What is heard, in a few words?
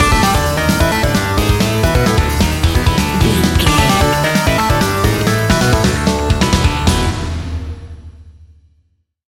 extreme racing video game level stinger